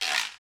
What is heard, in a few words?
Guiro Wood; Club-50 - Foundations Roland